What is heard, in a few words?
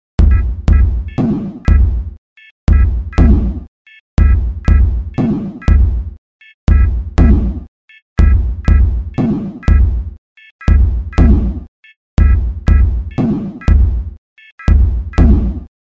Sine Wave